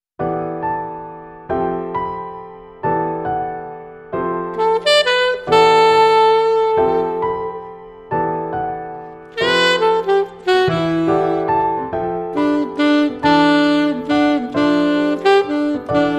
Jazzy Waltz

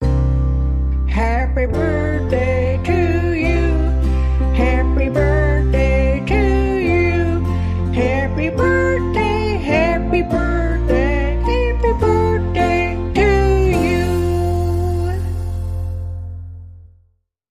Grandma Vocal Happy Birthday

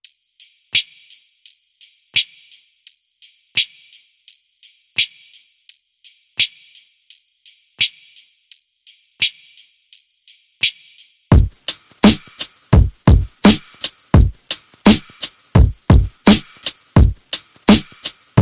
Chill Chops Beat

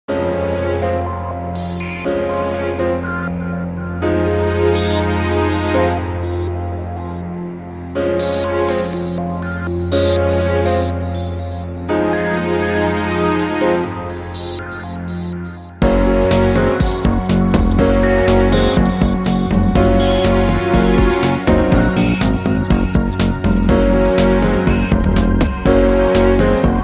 Electrosyn

